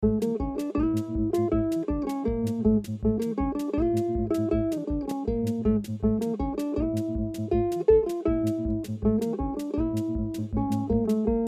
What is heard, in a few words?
short oriental